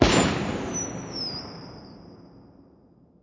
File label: Rocket blow